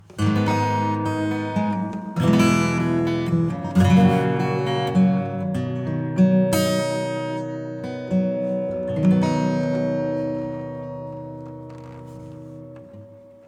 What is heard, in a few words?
Delay acoustic; Cluster; preset MYSTIX